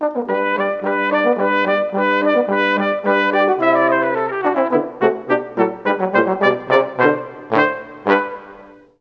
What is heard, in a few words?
Trp Tbn Hn; Juilliard Music Adventure